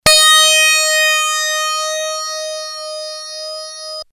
DX7II; yamaha; synth

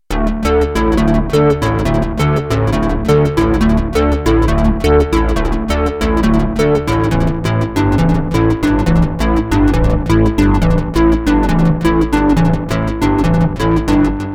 synth edm; Creamware minimax

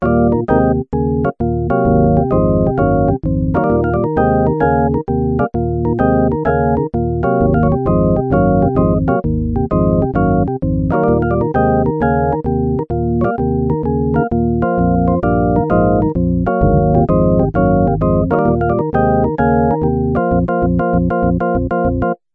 organ, yamaha tx81Z

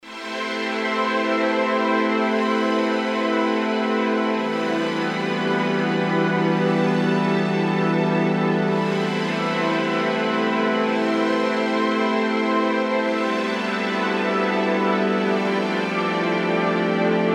pad digital, Alesis qsr synth

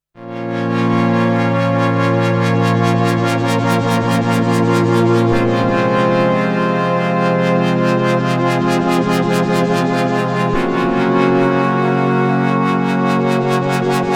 Pad with CVF MG, Korg DSS-1; main core based on 8 bits CPU Intel 8085 manages 8 voices polyphony

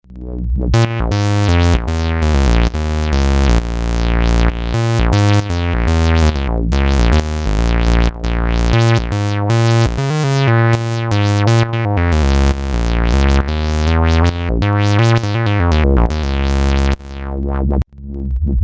synth lfo, nanozwerg; MFB nanozwerg

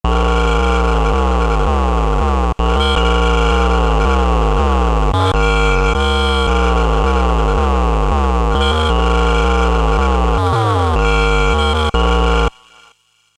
korg z1; Z1 promo cd 3

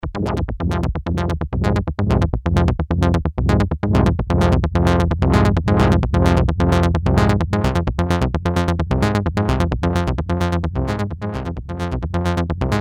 bass line lfo, Kawai sx240

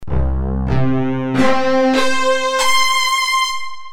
d50 Jete Strings; commodore amiga

